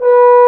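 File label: French Horn mf B1; Digital Sound Factory Virtuoso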